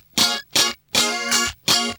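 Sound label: GUI 120, guitar; Best Service Gigapack 2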